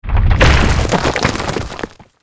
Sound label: Earth Elemental Death